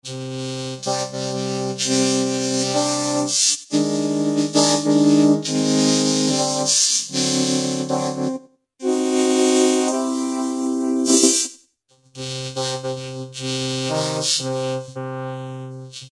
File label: Korg microKORG Vocoder DWGS; Factory Voices